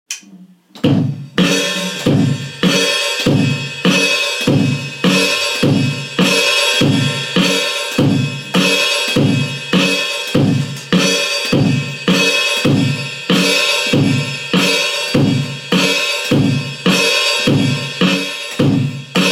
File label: drums